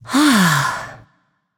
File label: sigh; smite; voicepack; tiamat